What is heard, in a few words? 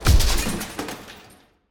Object Amplifier Explode; pulling no punches